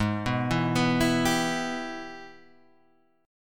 Ab Minor Major 7th Sharp 5th, down, Guitar, Standard; arpeggiated; JGuitar